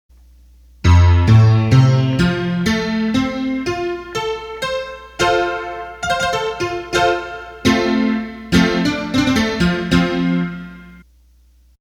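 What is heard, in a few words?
Honky Tonk Piano, Roland accordion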